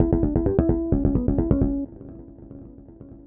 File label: Dynamonic Bass; SampleTank 2XL